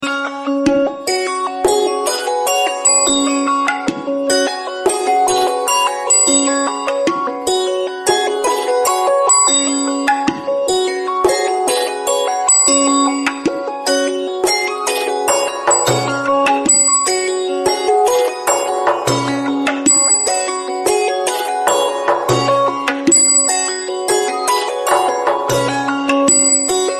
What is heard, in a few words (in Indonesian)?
nada dering samsung Galaxy S3